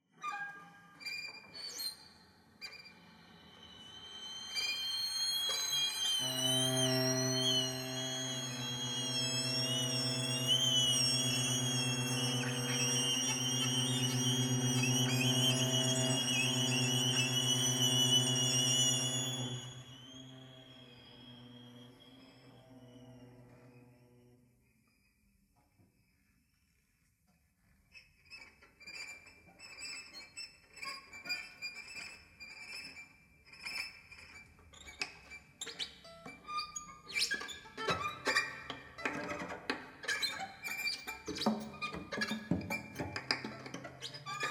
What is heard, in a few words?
String Quartet; Montvila